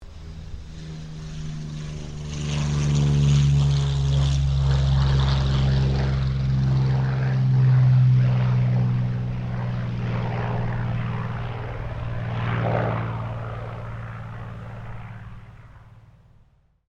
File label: AIRCRAFT P-40 KITTYHAWK TAKEOFF; P-40 Kittyhawk / Warhawk / Tomahawk; Airplanes; Vehicles